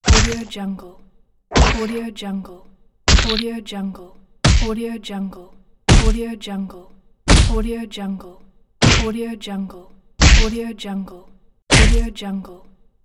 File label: boxing